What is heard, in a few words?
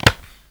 gun case